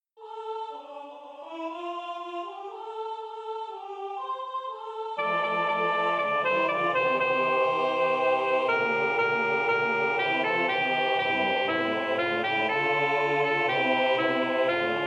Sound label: soprano